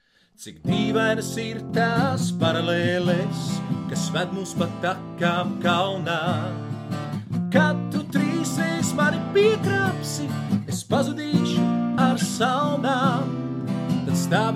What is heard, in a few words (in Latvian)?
ar gitaru; Jekabpils; Issavienojums; jekabpils dziedi